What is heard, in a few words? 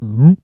burp; bucky